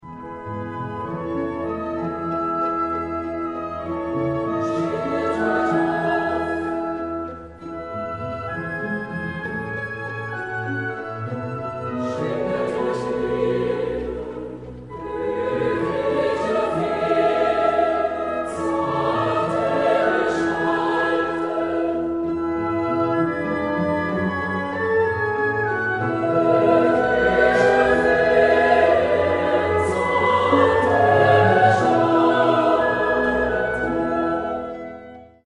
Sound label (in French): choeur